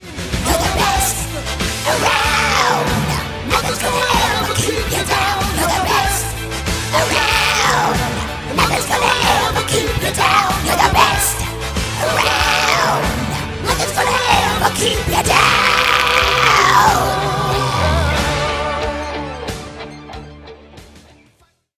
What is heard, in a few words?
Death Metal